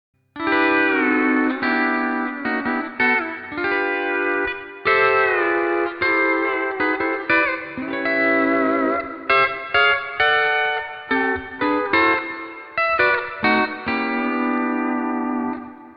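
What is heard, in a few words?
C6 Reverse Pedal, steel guitar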